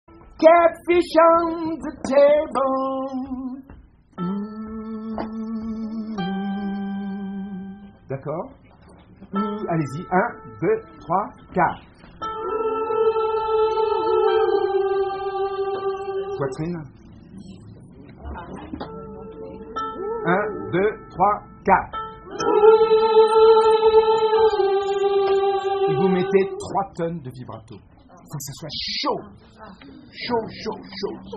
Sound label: Soprano